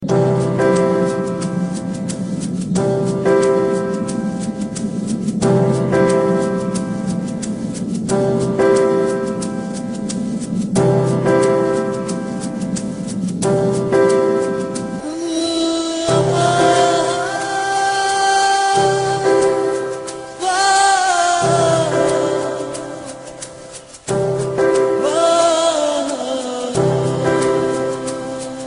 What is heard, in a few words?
nice tune, ringtones